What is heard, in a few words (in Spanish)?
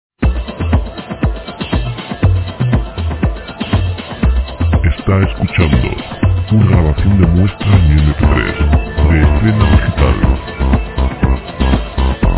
informativos; sintonias